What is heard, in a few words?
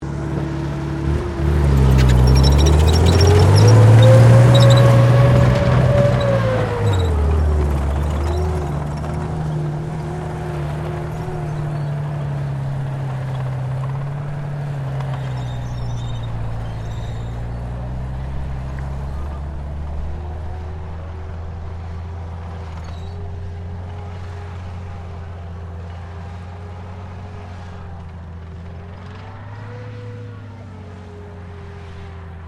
TANK CENTURION ACCEL DISTANT, British Centurian main battle tank; Truck Sound Effects; Trucks (Sound Effects:Vehicles)